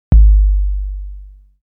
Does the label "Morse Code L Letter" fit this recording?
No